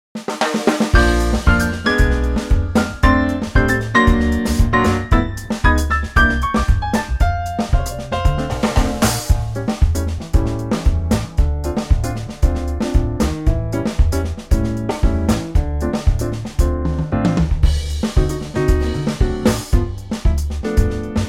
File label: F samba; jazzville